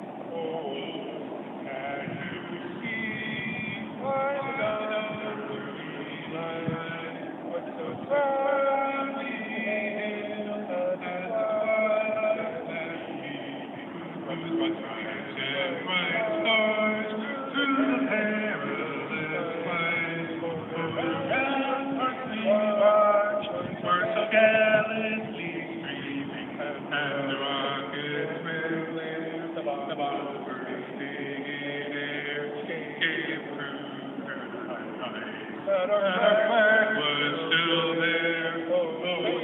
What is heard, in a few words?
sings national anthem